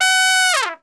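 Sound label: F#3 TRP SHF, Trumpet; Best Service; Brass Super Section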